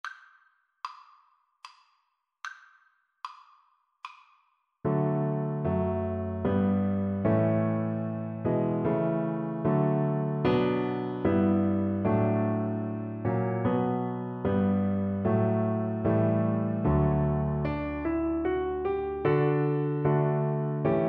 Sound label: god save the queen, Double Bass